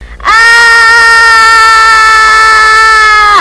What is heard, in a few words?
aaaaaahhhhh; Netstory